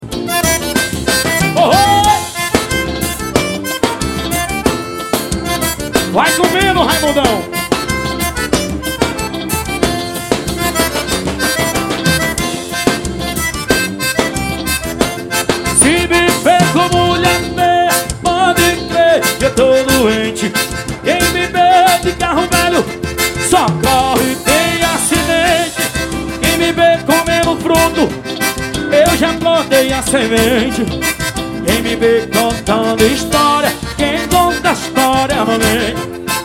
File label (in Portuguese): dance balance forró; manaus am